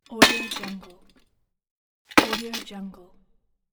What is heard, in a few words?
junk metal impact